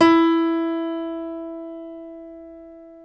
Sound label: PNO, Grand Pno, Roland